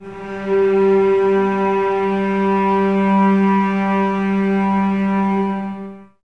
Cellos G 3, String Ensembles, No Vib; Miroslav Vitous

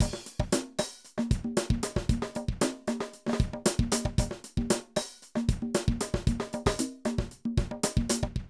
Drum loops, 70s funk

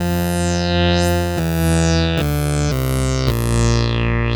Synth, Trip-Hop Loops; Sonic Foundry (Sony Creative Software) - Rondo Brothers Trip-Hop Loops & Samples; Apologists

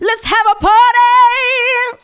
LETS HAVE, FEMALE VOICE; Best Service; Hallelujah

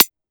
Trensient, kick

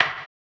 HAMBONE, Vocal Blues Soul; Spectrasonics; Planet

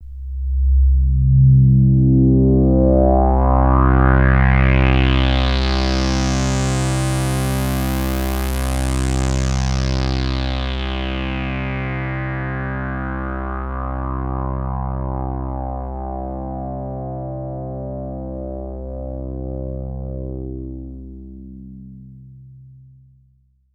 pad C2, Moog Minimoog; Pads

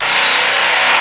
GTR SLICER, Synth FX Misc

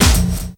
Snare; Esoterik Beatz; PH7 Kit

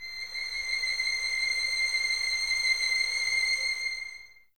C 7, 11 Violins, Soft; Miroslav Vitous

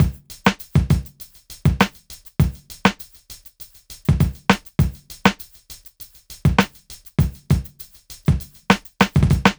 Drums, Hip Hop

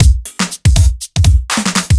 Kit 2 Heavy Swing 120; off the grid

